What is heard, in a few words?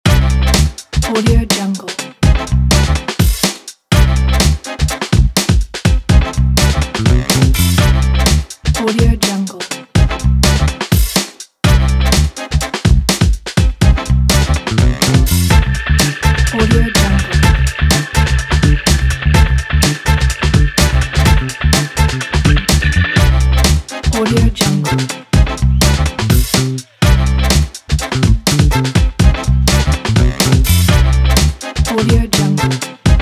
contemporary classic feel good funk